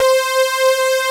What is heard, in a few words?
SAW, JV 1080; Pro-Rec - Synthsonic